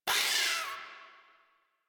Forest Rodent Vocal Pain; Warframe

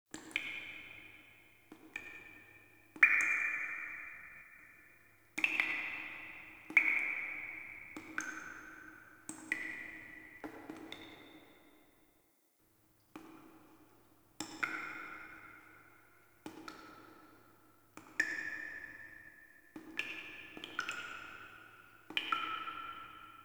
Dungeon Island Cave Drips; Ambience; Duviri

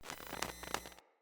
Decoy Cardinal Static; Objects; Thawtide

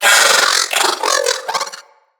Riot Moa Vocal Attack

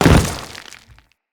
Step Dirt, Footsteps; Enemies; Grineer

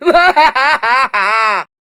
Laugh; Grineer Queens Guards; Battle Barks; Warframe

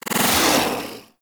Teleport Out; Warframe; Teralyst; Ropalolyst; Abilities

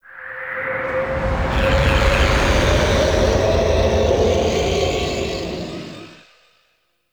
Exterior Sky Screech; Ambience; Duviri

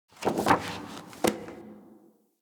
Station Misc, Ambience; Soul Plane; Orlick

